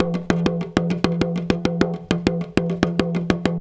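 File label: small tama groove; Best Service; World Colours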